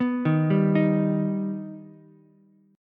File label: Eb Augmented Eb, Ukulele; Eb+ arpeggiated; JGuitar